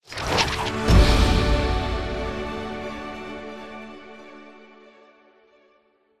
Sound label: Mission Complete; The Amazing Spider-Man